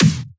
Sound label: Snare Clap; FLPRO